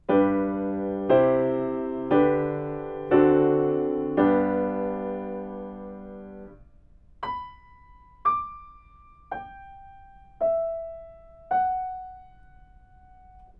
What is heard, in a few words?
melodic fragments; ear training